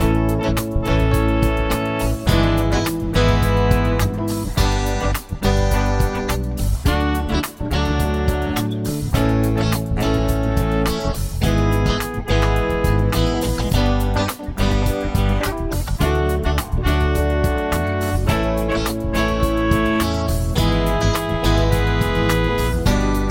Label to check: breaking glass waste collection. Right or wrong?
Wrong